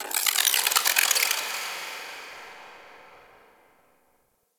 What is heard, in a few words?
Tech Small, Ambience; Warframe; Cephalon